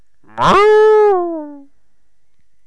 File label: bull die; megaglest